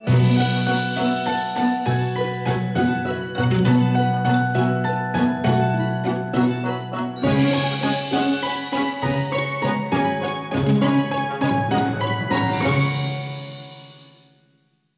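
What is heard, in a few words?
Nokia ringtone; Nokia 1661b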